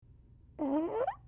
Confused Bird

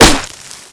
Bullet Impact

